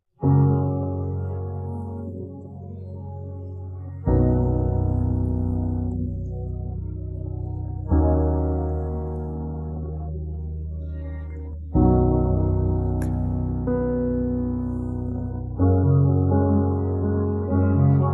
karaoke version

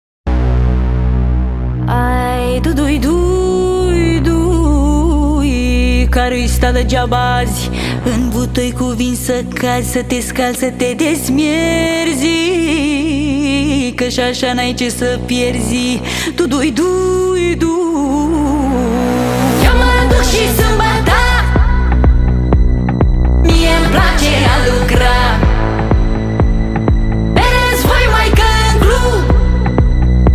slap house music

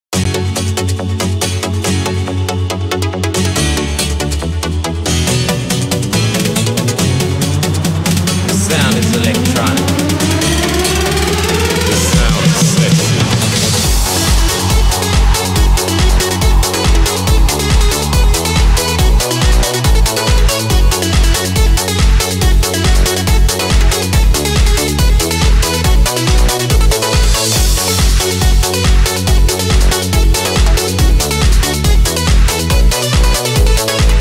sound electro

